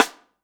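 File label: snare, E-MU Orbit-3, Drum Machines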